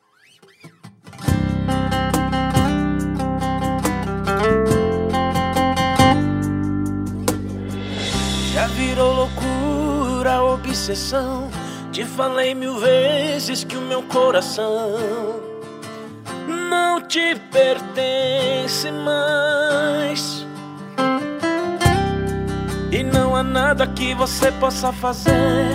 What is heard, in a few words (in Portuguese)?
sertanejo sofrência